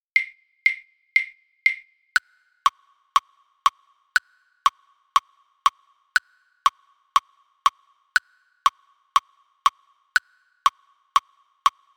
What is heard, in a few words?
click; tango